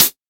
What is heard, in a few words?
crispy hat sample a sharp key